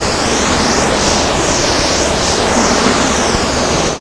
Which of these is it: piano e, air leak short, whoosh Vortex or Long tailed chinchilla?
air leak short